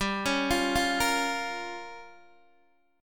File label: G Minor 7th Flat 5th, Guitar DADGAD